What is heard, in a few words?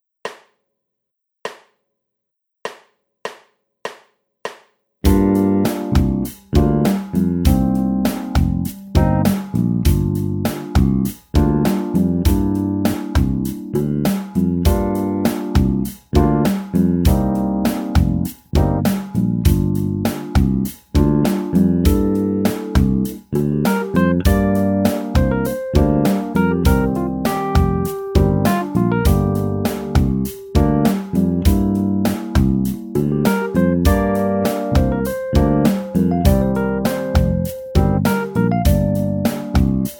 Bass riff; lezioni; Bass Lessons